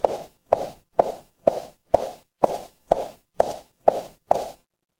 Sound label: footsteps heels